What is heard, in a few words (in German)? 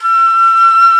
FLOTE SOFT